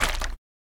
Attack

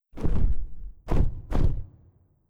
Skel Drake Flapp; Legend of Keepers (gamerip) (2019)

